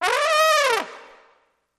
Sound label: Trombone Elephant Call